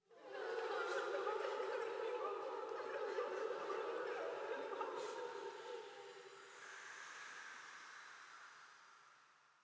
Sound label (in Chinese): 诡异的声音; Environment